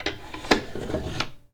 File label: Door Display Case Slide Open; Doors